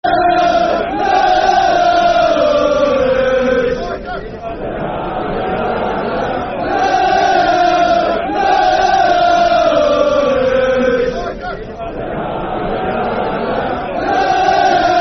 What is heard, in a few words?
Football Chant; Nanana Hudders; Huddersfield Town Htfc